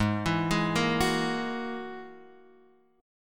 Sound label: G sharp 7th Suspended 2nd Sharp 5th, Guitar, Open F; G#7sus2#5; arpeggiated; x,3,4,3,1,1; JGuitar